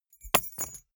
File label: key ring small drop carpet hard